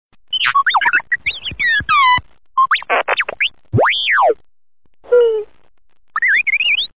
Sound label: SMS ringtone; DIGITUNE